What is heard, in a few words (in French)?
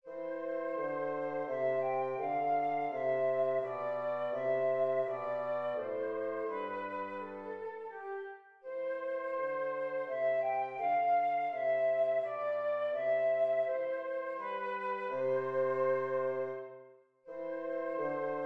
menuet majeur; bach johann christian